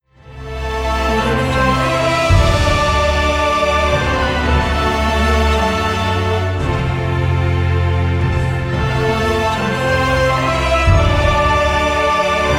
epic orchestral cinematic logo intro

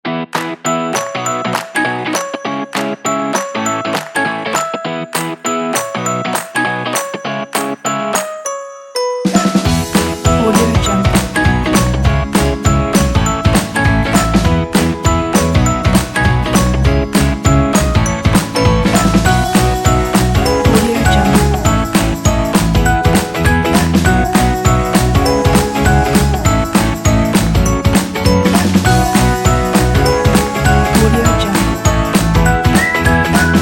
upbeat fun energetic indie rock

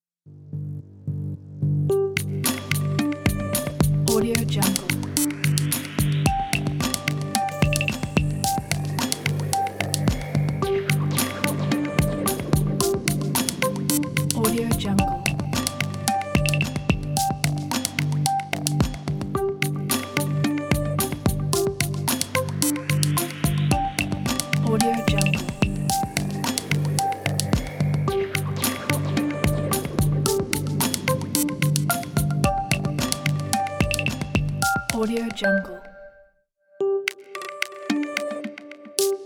game show timer

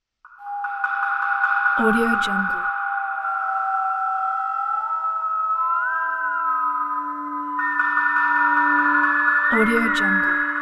spooky horror accents

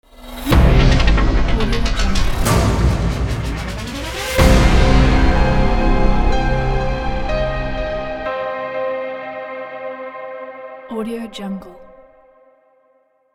cinematic epic futuristic glitch logo; imperiumsound

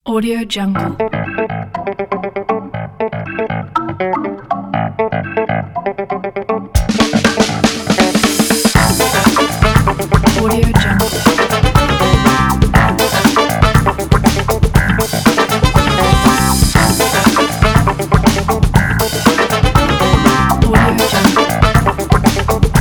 funky logo intro

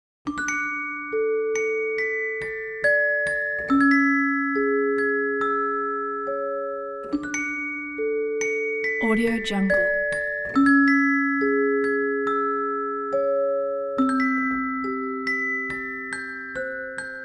sweet celesta ad; ilovemedia-es

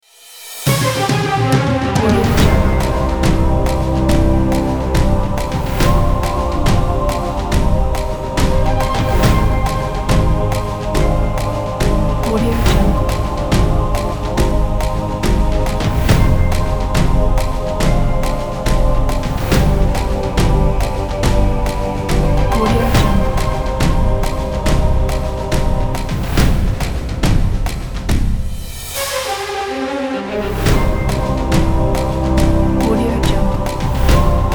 quiz show choral timer

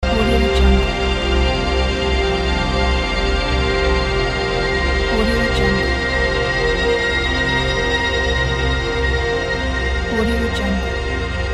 orchestral ambience

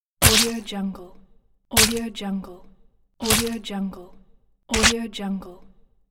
splatter

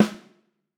drum snare hit

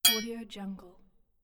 sword hit

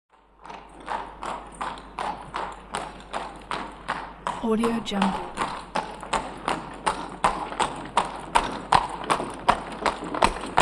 horse and carriage trot then stop; hollywoodedge

